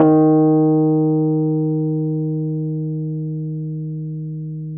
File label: RHODES EB2, Rhodes Electric Piano